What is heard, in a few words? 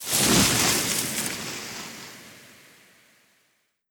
Enchante Leaves